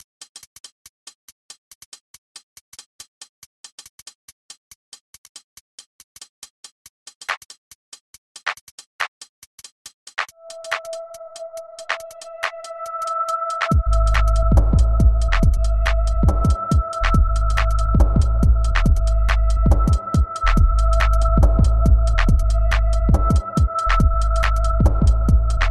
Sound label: beat